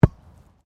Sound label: SOCCER BALL BOUNCE GRASS; Soccer ball sounds; Sports